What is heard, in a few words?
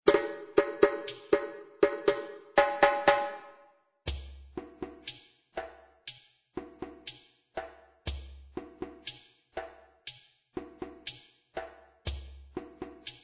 Djagbe djembe